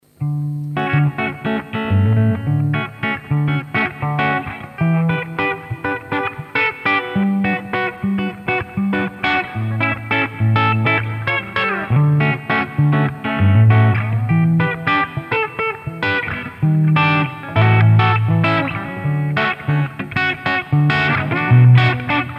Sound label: Clean gain max; JFET